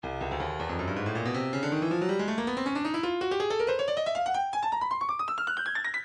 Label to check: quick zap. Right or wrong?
Wrong